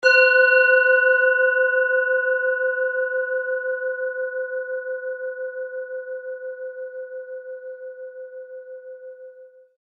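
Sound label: tibetan bowl sunset, C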